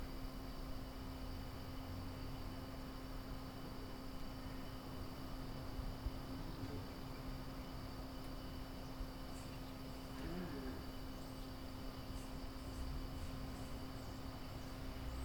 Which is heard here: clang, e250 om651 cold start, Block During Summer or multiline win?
Block During Summer